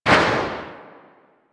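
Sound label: submachine gun Tail; Weapons; Warframe; Tenno; Sundial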